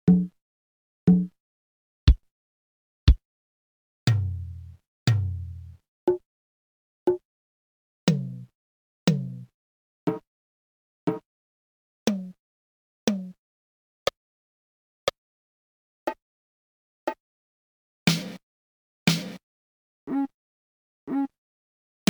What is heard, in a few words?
yamaha psr 36 samples drumkit